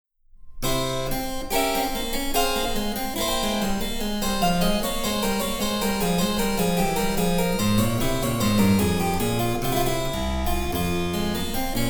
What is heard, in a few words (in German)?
Cembalo; Mark Lindley WTC 1